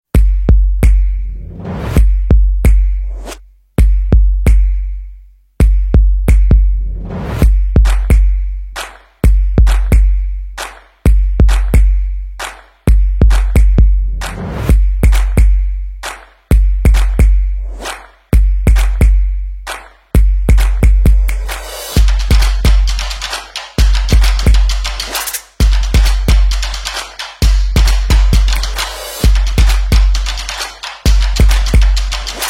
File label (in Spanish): Musica de Percusion para Deportes y Videos, Musica de Fondo